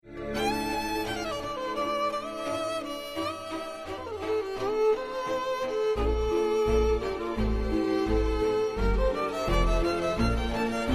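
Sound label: violin mix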